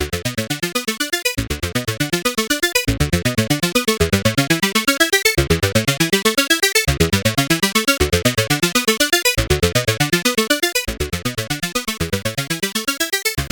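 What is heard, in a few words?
Arpeggio Distortion Crusher; WAVES Element 2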